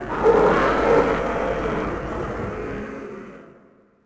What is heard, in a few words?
walla cheer; tusken; Star Wars Obi-Wan; XBOX